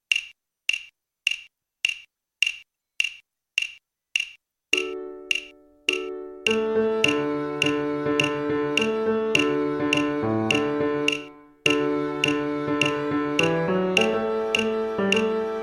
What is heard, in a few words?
Bass Instrumental